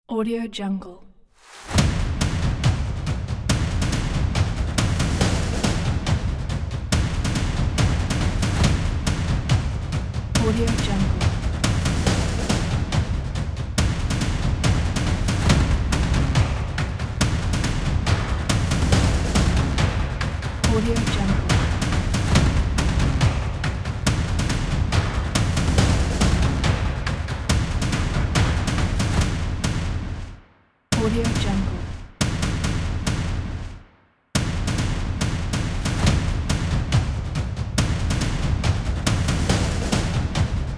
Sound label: action percussion drums